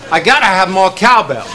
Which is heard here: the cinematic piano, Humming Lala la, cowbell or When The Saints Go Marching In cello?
cowbell